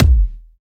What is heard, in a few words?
Edm Kick